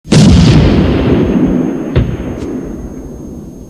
blow

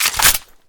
pistol clip in; weapons; deluge

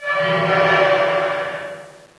long ambient stress

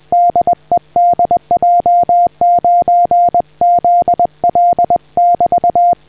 funk; lahore

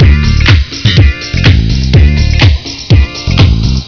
music

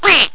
kill duck

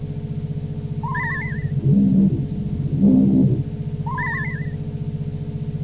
hum; de eh

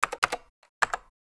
typing

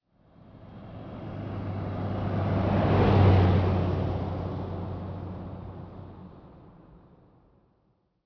car industry

